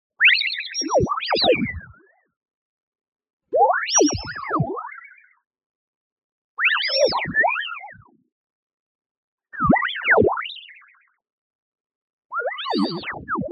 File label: tonal, filter creature vocal long; boom library; Anime Essentials